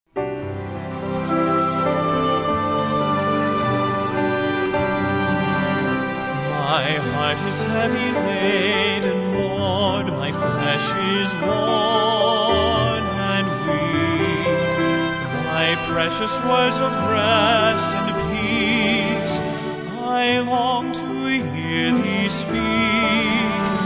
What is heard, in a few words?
Singers And Orchestra